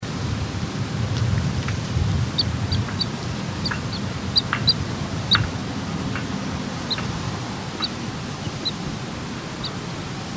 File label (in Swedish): Smasnappa